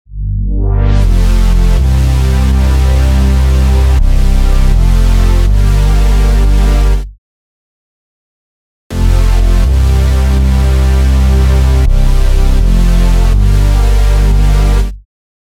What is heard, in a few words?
Repeater Synth; FOCUS Preset Pack